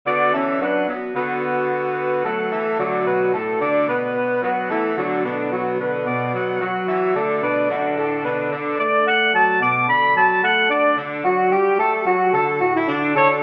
Saxophone Quartet in D

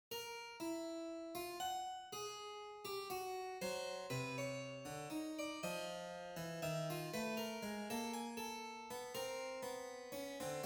Dissonant Exercise

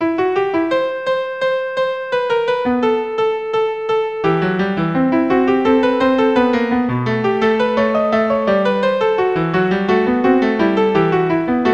Counterpoint, 2-part invention in E minor; Elem. Counterpoint (1910); Exercise 15

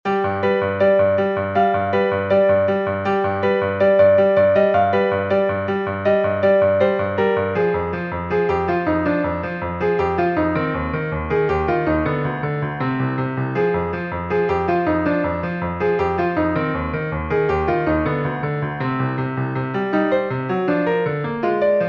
Piano

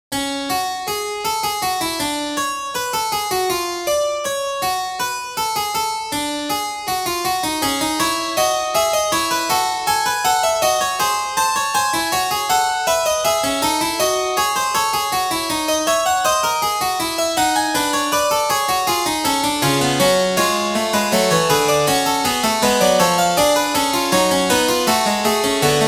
Fugue in F-sharp minor

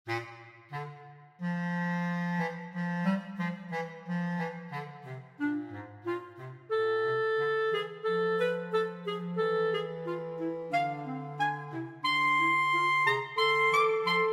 Tertian; Persichetti Exercise 2-14; clarinet trio

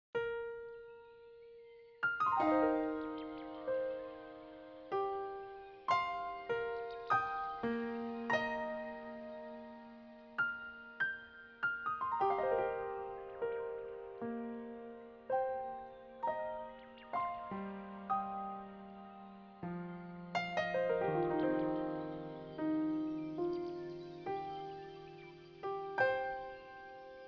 Raindrops

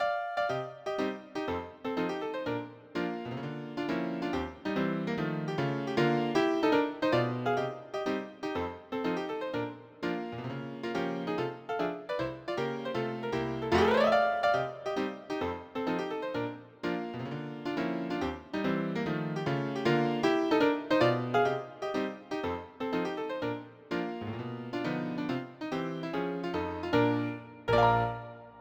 Humoresque

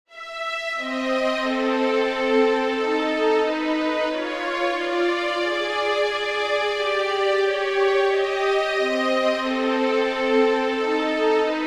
Quasi-Atonal Xenochant

